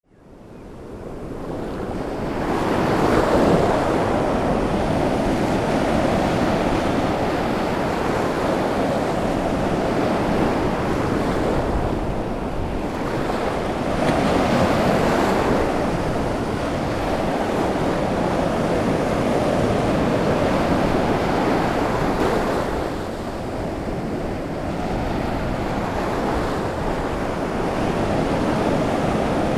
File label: WATER